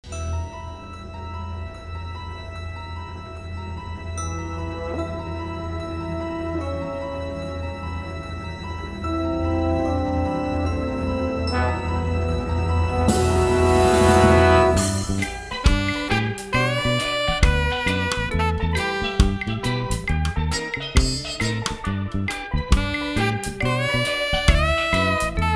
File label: Seagulls